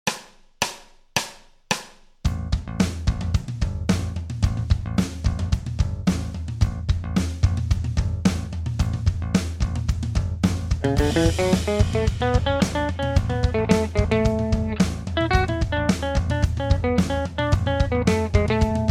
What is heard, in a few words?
BPM110 A G In Bass